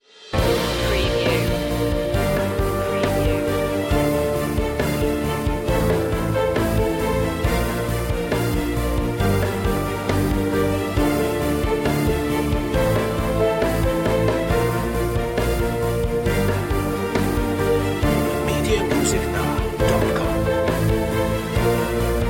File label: the fountain of life no choir intense